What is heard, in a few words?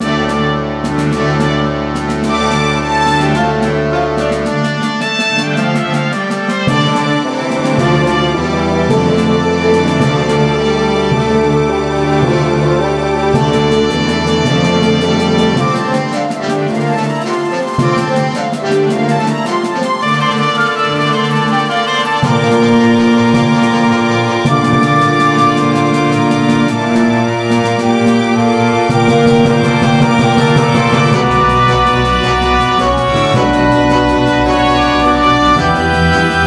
Orchestra Allegretto sonoro; Sibelius Orchestra